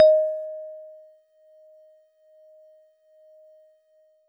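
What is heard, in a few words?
Warble Celeste DX100 D#0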